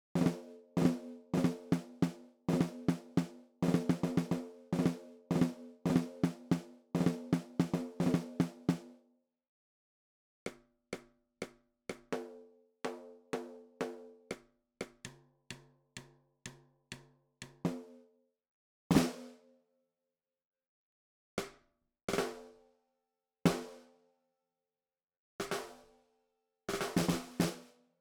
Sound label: snare; cherry